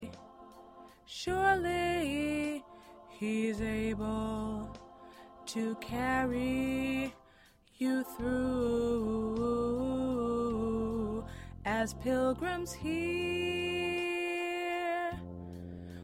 Lead 3

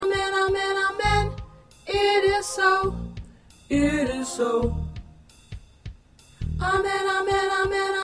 Alto

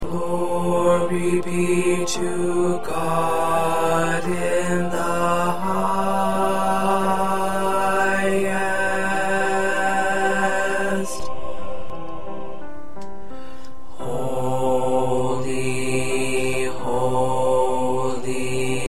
1st Bass

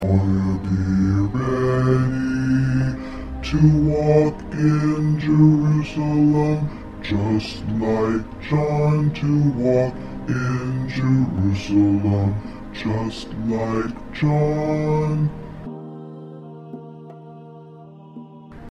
Bass

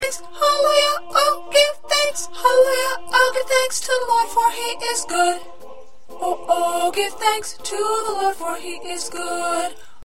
2nd Soprano

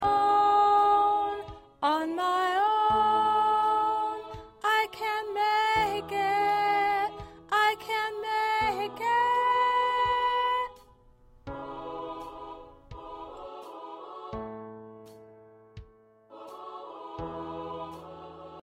Lead

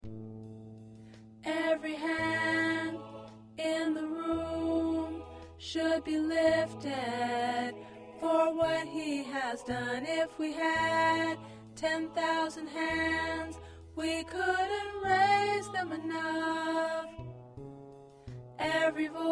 Alto

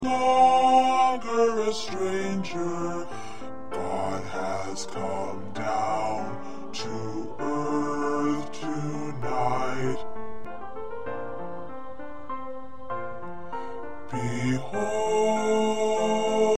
Bass

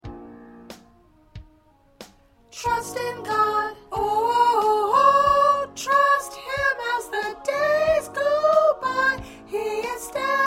Soprano, gospel songs; Nazarene Baptist Church; practice tracks

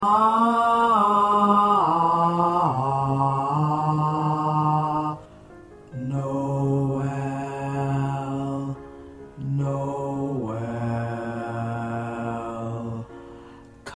Bass

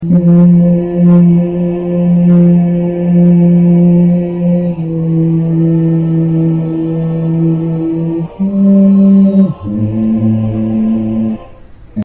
Bass

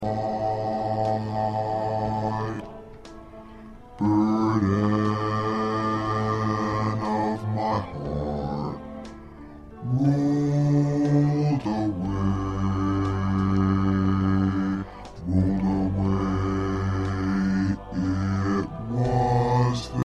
2nd Bass

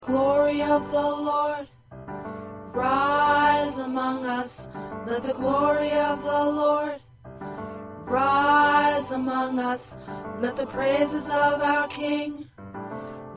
Tenor, choir

